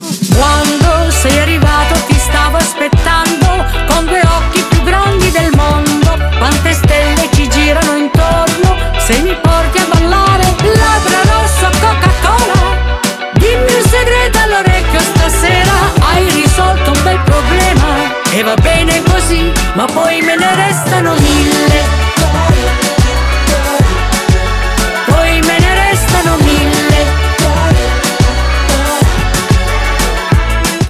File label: Ringtone